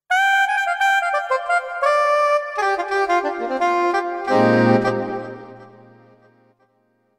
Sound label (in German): sopran saxophon